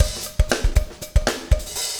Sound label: breakout 120, 120bpm, loops